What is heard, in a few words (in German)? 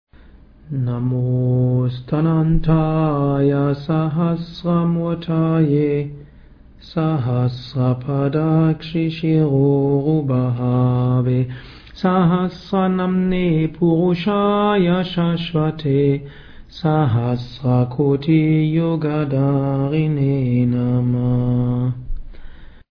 Hymne nach der Meditation; Namostanantaya